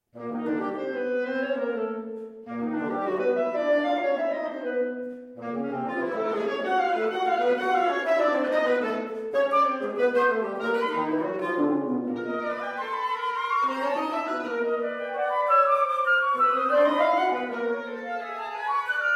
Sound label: Woodwind Quintet